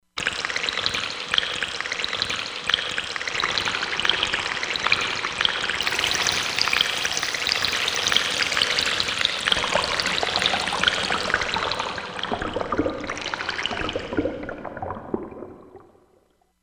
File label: Water C6; Korg MSC-16S Environment